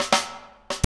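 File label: 84 bpm, Funky Drums; AMG; Now CD-ROM (Roland); Neil Conti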